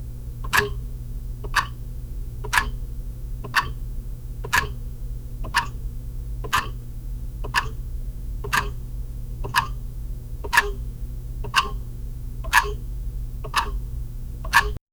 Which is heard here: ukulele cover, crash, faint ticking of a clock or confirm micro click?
faint ticking of a clock